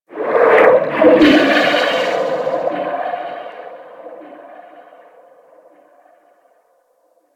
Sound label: Ocean Poison Plant; Ambience; Warframe; Grineer Ocean